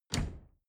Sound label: dishwasher door snap close